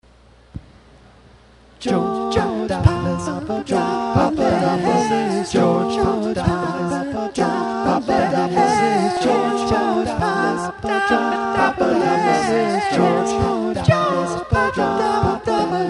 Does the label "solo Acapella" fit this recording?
Yes